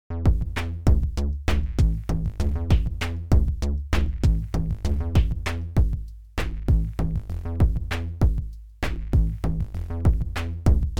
Microtonic Pattern; Sonic Charge

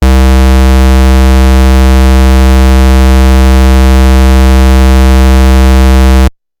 Roland SH-101 Pulse

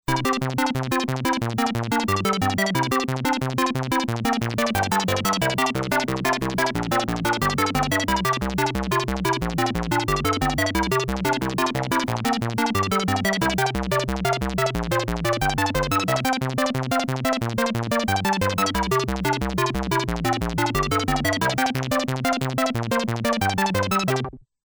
Korg Monologue Shape; Milky Way

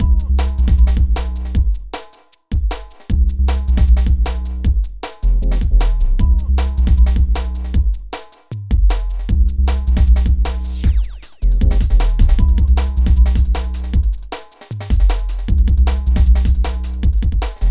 SR18 Jungle

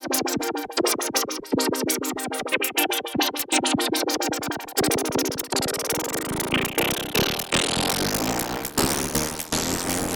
Rossum Electro Music Linnaeus Filter ext F MOD FM

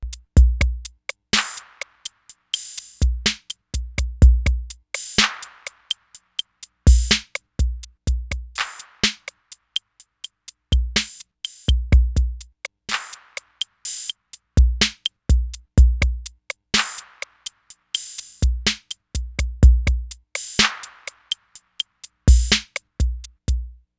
808 Wet

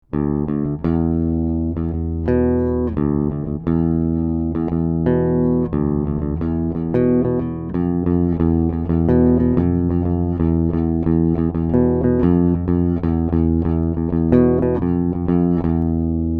Bass Tube

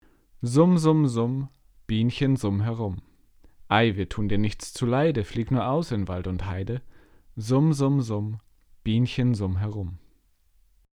Pre73 LOW-Z 65db

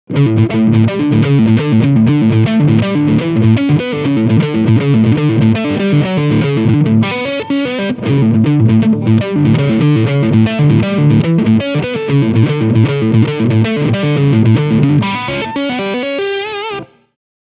Riff Leadchannel

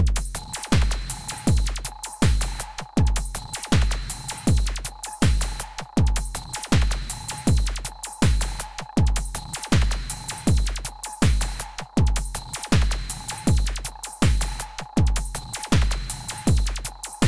Microtonic Indicator

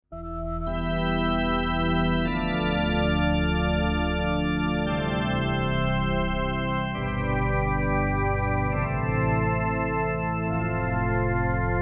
sq80 organ

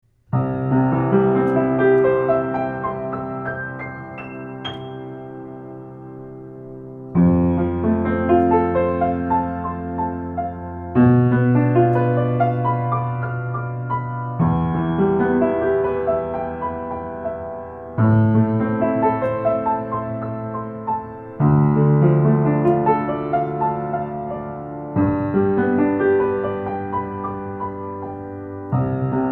Neumann KM83 Piano 160cm behind player

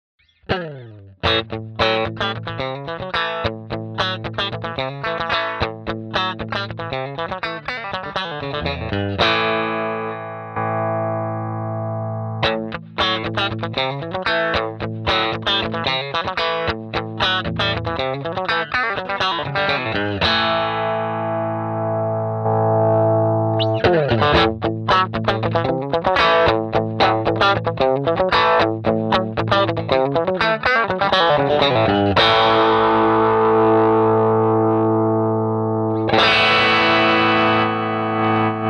Tele Clean Boost

